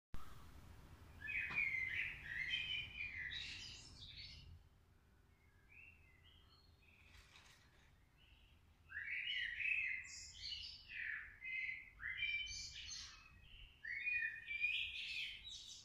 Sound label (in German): Vögel